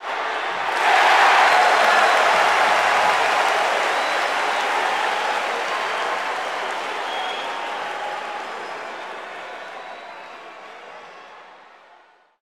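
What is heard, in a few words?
Basketball Crowd Cheer; SFX Kit; Crowds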